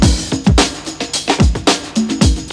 HYPER BEAT, BREAKBEATS; Crypton - DrumBase5000; BREAKBEATS#4

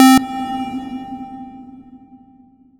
Lead C3; Zero-G Club Techno

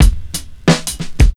DRMS, Underground Hip Hop

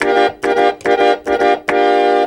Harmonium; Sonic Foundry (Sony Creative Software); World Pop; Keyboards

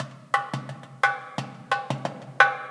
DUMBEK LP, LOOPS; Spectrasonics; Heart of Africa 2

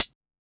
Hat Closed, 606; classic machines

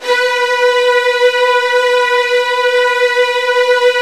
B2, Virtuoso Strings

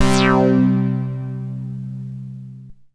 MASS LEAD, Synth; InVision Interactive; Keith Emerson; The Most Dangerous Synth and Organ; SYNTH LAYER

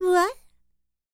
Vocal Planet World Voices, NOMADIC

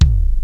DRUM STUFF, ENSONIQ VFX; Rubber Chicken Software Sample Bank

